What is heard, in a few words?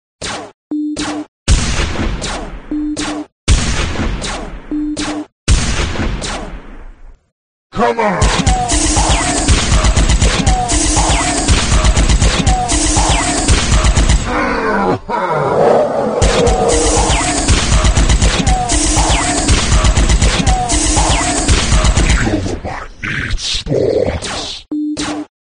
trem ringtone